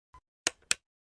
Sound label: flashlight; fnaf